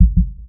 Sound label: npc heart; DeadFright